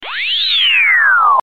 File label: Wistle Msg